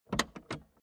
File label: Car Classic Bentley Eight Boot Trunk Unlatched Open Two Parts; Transport; Car Sound Effects